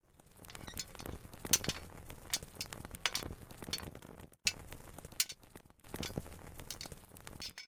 Incense burn; Phasmophobia

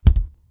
Morgue door close; Phasmophobia

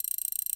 exp gain loop; Phasmophobia

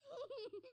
Bear Laugh; Phasmophobia

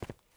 FOOTSTEP Trainers Asphalt Run mono; Phasmophobia

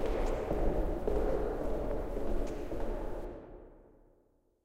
Steps; Phasmophobia

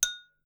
glass; Phasmophobia

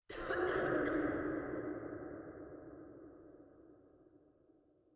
Cough; Phasmophobia

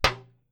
metal box; Phasmophobia

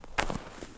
FOOTSTEP Trainers Snow Compact Walk Slow; Phasmophobia

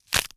BREAK Fast Clean stereo; Phasmophobia

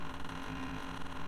Door Loop; Phasmophobia

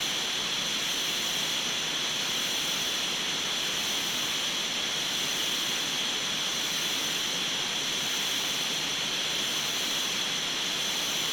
Static; Phasmophobia

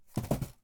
Throwing; Phasmophobia

